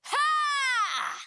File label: win "haaa"; ben 10 steam camp